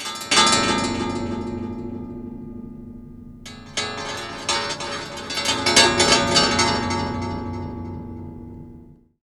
Delayed, Wire & Tin, contact mic

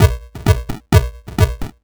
4 floor beat, trance

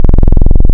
Saw Width Mod, wavetables; Animoog

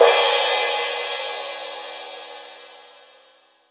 Paiste, ALL DRUMS; Best Service; Alpine Volksmusik

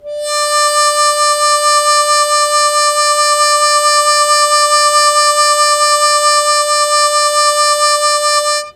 Long Sustain Fast Wah Wah D2, Harmonica; Zero G - Harmonica

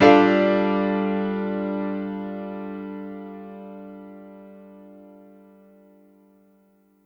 Piano stack (D) min; Sonic Foundry (Sony Creative Software); Ugly Remnants VOL-2

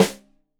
Rim Shot Stereo, drums

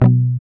GUI B, Acoustic, Roland

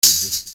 Hat, EuroDance

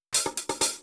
Drum Loop 128BPM Fill; VEC5 Drumloops; VEC5 Multi Loops; Loop Elements

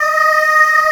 G3 pop chorus, VOCAL

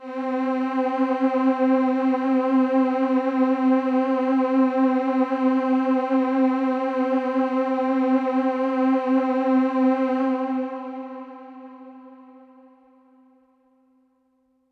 Pad C, Synth Prophet One Shots; Electronisounds

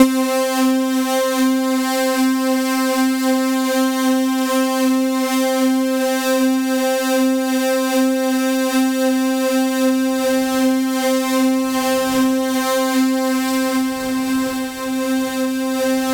C2, Synth Line; 90 s Sample CDs; NiceBeats; SynthLine VOL-14

